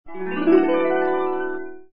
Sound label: harp cord